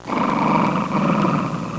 dog growling; pets; Sound Effects for Multimedia - Volume 1

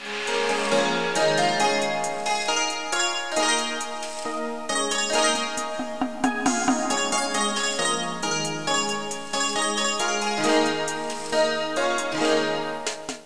music

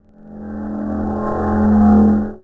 Noise; PCGamer50

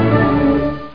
Lightning Storm; Win95

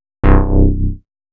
growl bass

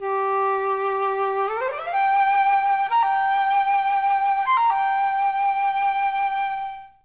FLUTE

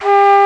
FLUTE

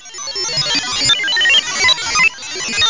computer; Graliens

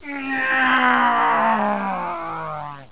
plane; Santa's Workshop - Advent Calendar for Computers

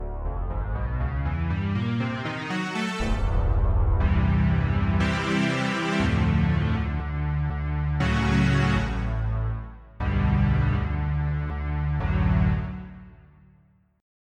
Pro5 String

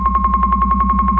ray gun

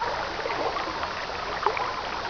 stream

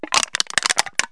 Crushed Tin Can; Wild and Wacky Sound Software; 1,000 Sounds; Household; Cans & Containers

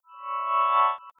login

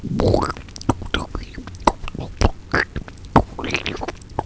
SPLOOSHY; Reflux

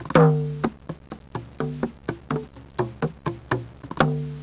drums; DAoC

